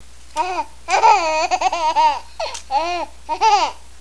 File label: baby laugh